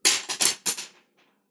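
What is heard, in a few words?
chopsticks; ohashi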